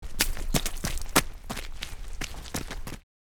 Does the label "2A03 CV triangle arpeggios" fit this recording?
No